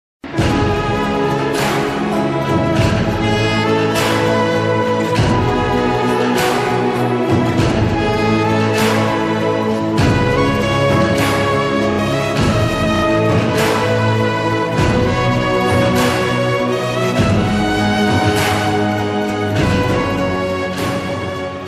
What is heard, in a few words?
sad bgm; imaika nodigal